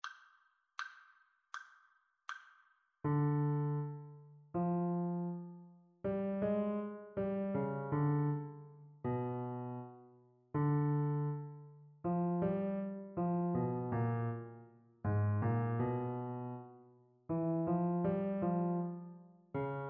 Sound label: melodic dictation; ear training